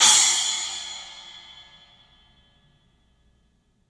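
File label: CLUB CYMBALS; 3D Sound; 3D Drums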